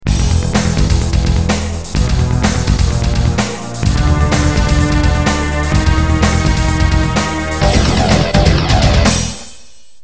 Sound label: music; N64 Project